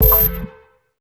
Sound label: health restored quiet